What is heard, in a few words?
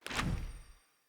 UI Default Map Close